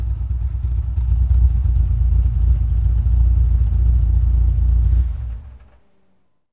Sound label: Viper GTS Off; Automobiles